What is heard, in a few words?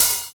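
TR-909 pedal hi hat; Hi Hats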